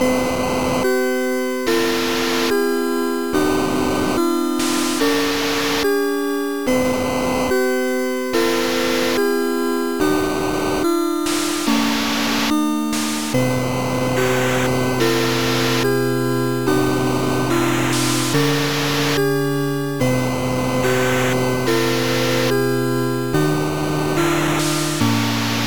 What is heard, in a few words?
Death; dewinTheme